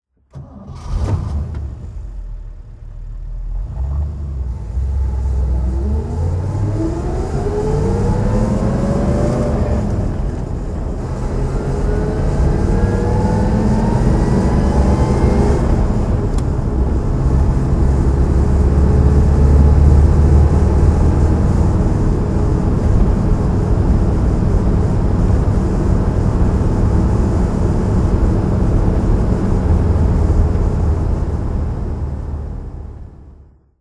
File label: chevrolet corvette drive